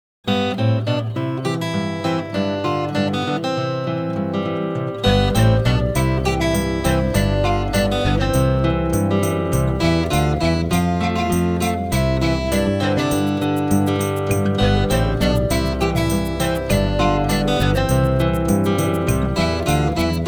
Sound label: Instrumental; Conte musical